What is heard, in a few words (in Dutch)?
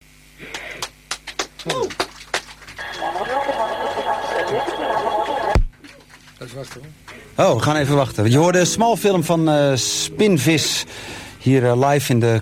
Accordeon; Accordeon pakken; Live in Club Lek Salon